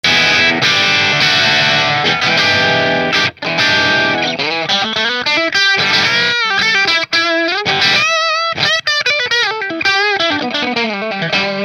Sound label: Nola Voodoo 3 p90 driftwood bridge middle marshall